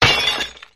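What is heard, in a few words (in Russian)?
стекло бьётся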